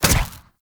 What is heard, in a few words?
Ghoul Drill Flame Burst; Warframe; Grineer Ghouls; Ghoul Drill Sergeant